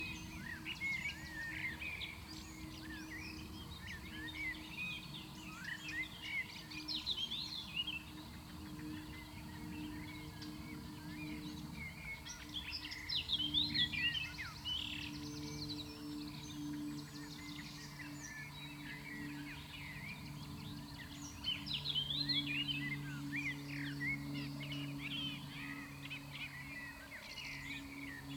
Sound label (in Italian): soundscape primavera maggio collina giorno; Soundscapes